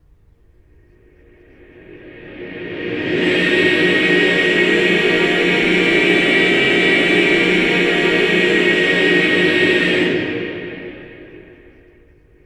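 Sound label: CLUSTER reverse, Voices; CLUSTERS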